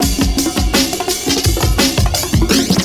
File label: PERCS, 70 s Breakbeats